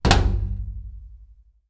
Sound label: household small metal cupbard door close; zapsplat